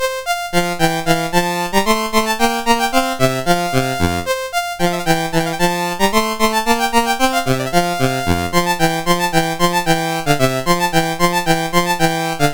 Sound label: Allegro in F Major, CSG8580